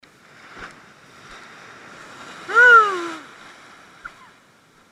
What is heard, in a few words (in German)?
Welle Johler Mann